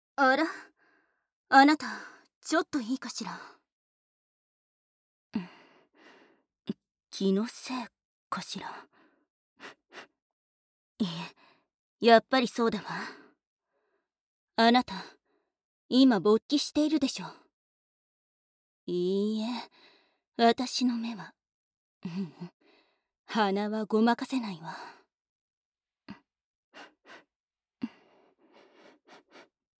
堅物系（匂い嗅ぎ・キス・耳舐め）

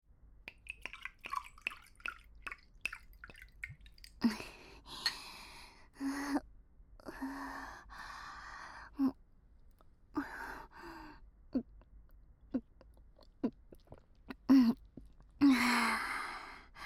晩酌（隣）

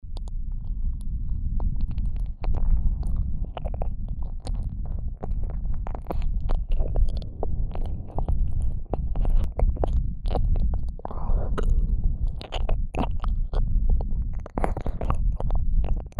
右耳舐めループ; ASMR; 耳塞ぎあり

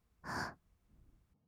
吸う音, ブレス; ASMR; 1200 kawaii voice!!

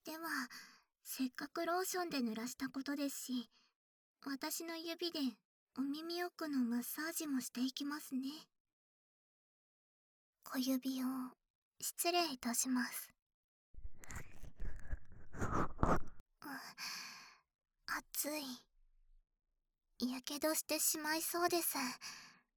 【左】指耳かき

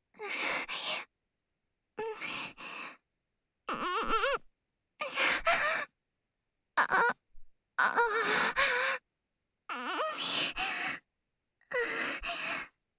喘ぎ（SEなし）喘息

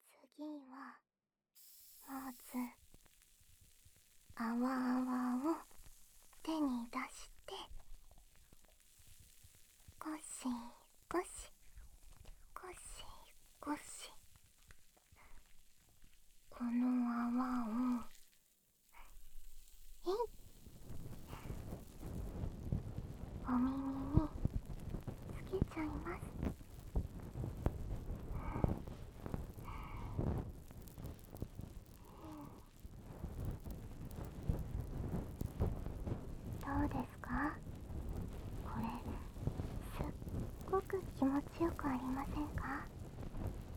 お耳のマッサージ; お耳のマッサージ! 待ってました!

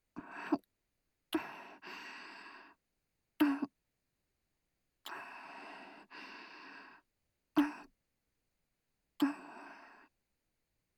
キス（接吻)

